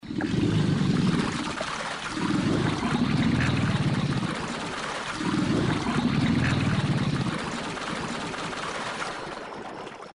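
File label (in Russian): Звук воды от колебаний тела аллигатора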